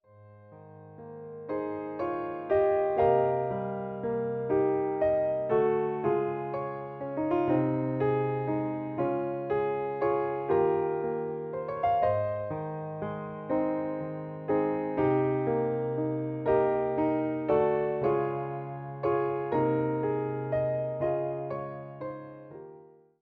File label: Pop-Ballad